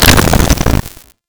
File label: Chain Saw Tonfa Stack Buff Drop